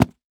Foley Footstep Soft Sole Work Boots Jump On Cement Quick